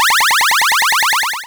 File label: victory